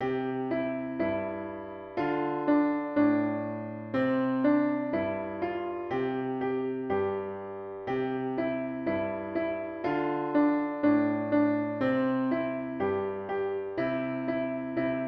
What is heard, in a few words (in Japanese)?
ちょうちょ（ピアノ）